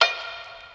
perc toll, Drums, One Shots; Slate; AURORA Sample Pack

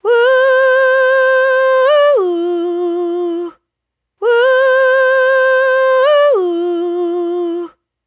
riff F, Dry Vocals; Raw Vocals; Slate Digital Omnivox Vocal Sample Pack

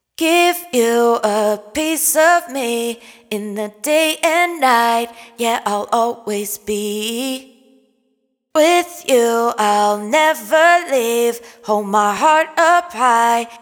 hook 115 Fm wet, Vocal; Slate Digital Omnivox Vocal Sample Pack

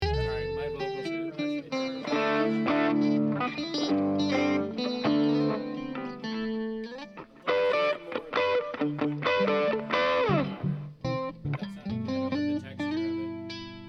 Strings Only

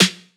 snare oneshot; Pop; Drums; SD PASADENA Pop Sample Pack; Slate

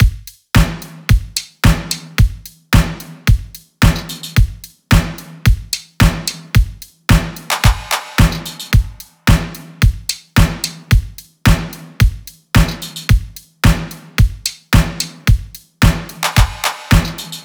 drums 110; Drum Loops; Full Drums; Supra; Slate; MIDNIGHT Sample Pack

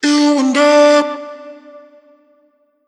Vocal Sample Pack Phrases D; Slate Digital Omnivox Vocal Sample Pack

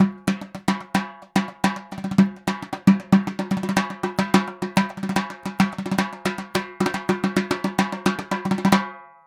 dhol loop, 110

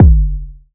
Kick Toney, 808 Kicks; Slate; Vintage Drum Machines Volume 2 808 Edition

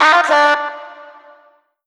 Omnivox oneshot Dm, Vocal; One Shots; Slate Digital Omnivox Vocal Sample Pack

